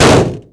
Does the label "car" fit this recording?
Yes